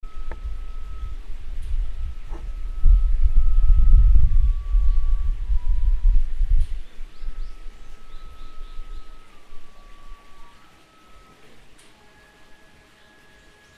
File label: Shomyo flute music in ohara mountains